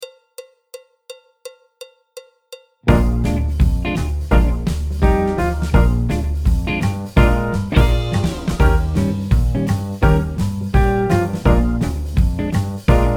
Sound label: clarinet, tenor, Blues; Jazz Syllabus Level 1